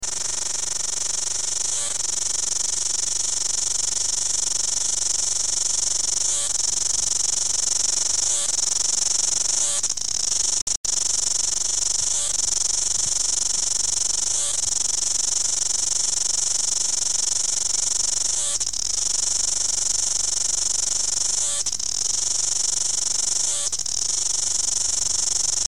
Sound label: cicada song